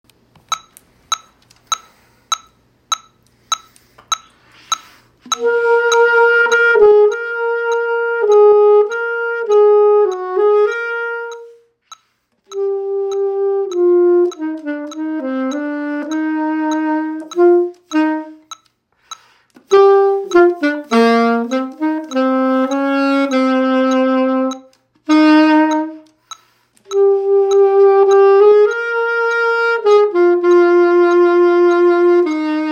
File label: symphonic band audition winds